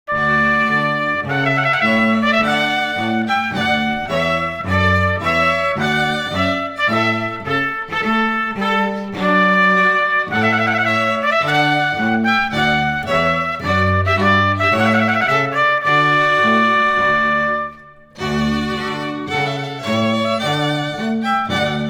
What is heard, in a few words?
Trumpet Voluntary